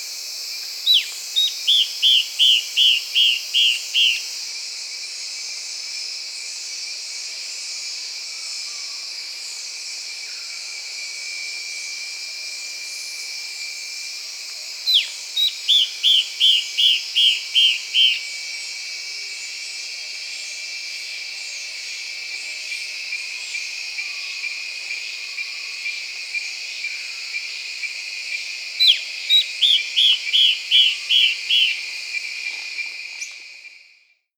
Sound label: Yellow browed antbird; صدای پرنده مورچه مرغ ابرو زرد